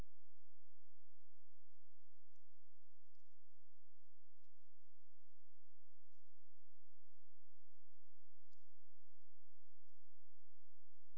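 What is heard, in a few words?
hydrophone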